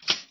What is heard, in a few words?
toaster up